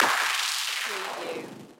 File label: Space Scifi Sound - stones